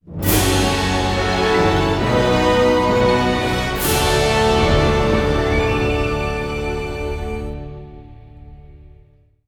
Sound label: Event Fanfare; lego worlds monster pack